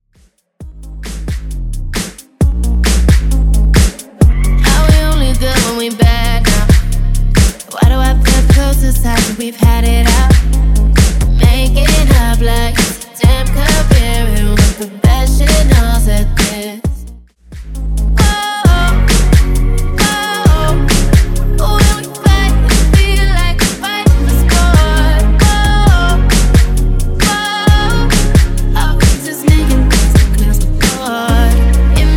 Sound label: Mashup Blend